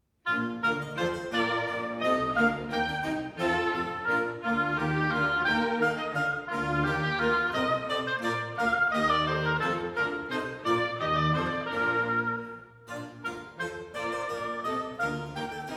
Oboe Concerto in B flat major - Vivace; Handel; Oboe Concerto No. 1; HWV 301

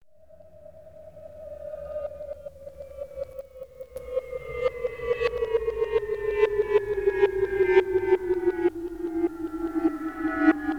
Drums; Cass Drums

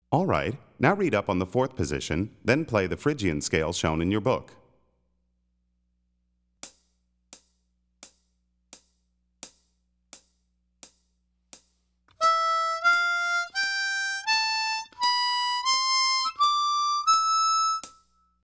The Phrygian Mode, harmonica; Play Harmonica

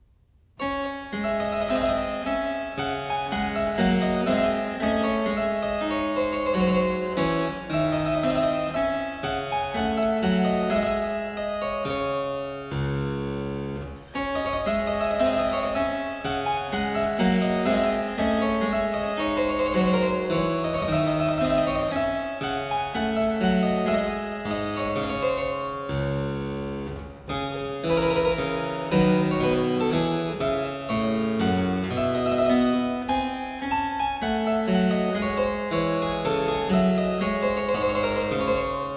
Minuet, harpsichord; Complete Chamber Music; Brilliant Classics; Miscellaneous pieces for the harpsichord

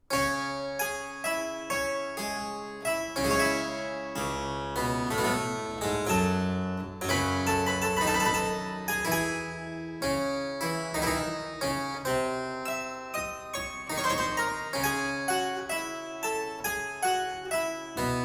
Suite in D minor Sarabande II, Harpsichord; Buxtehude; Complete Harpsichord Music

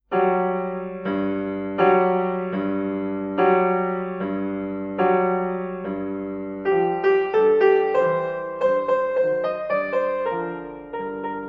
solo piano music, Peasant Dance; Юдина; Наследие Марии Юдиной; Bartok; Microcosm

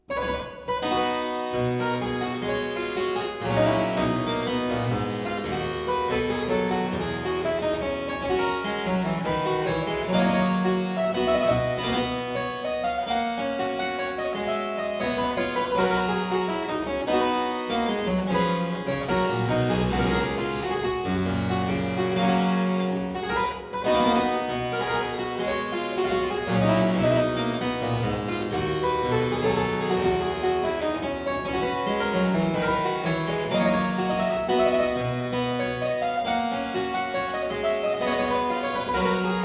Suite in C major - Courante, harpsichord; Buxtehude; Complete Harpsichord Music

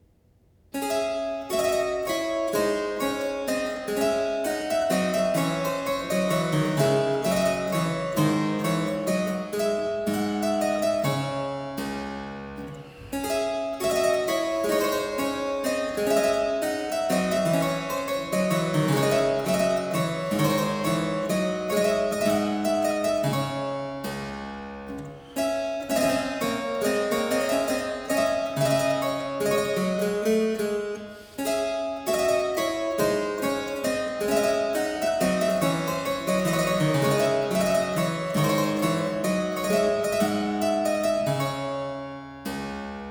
New Minuet, harpsichord; Complete Chamber Music; Brilliant Classics; Miscellaneous pieces for the harpsichord

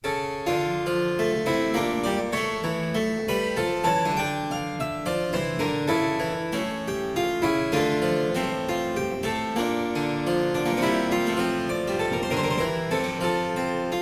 Suite in D Minor - Courante, Harpsichord; Suite No. 3; HWV 428; Handel; Suites for Harpsichord

